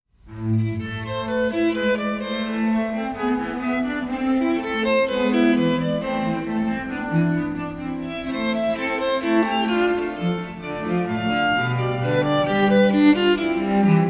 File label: Invention in A minor; Johann Sebastian Bach arr. Christian Badzura; Invention no. 13; BWV 784